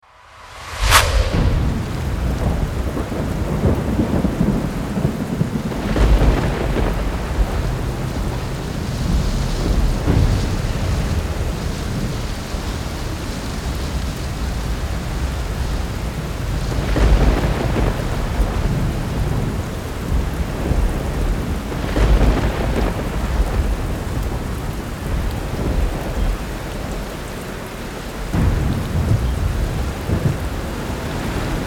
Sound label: Clap, Storm Underscoring